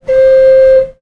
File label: flute